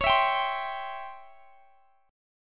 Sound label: Db Minor Major 7th Flat 5th Db down; strummed; DbmM7b5